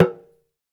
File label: Talk Drums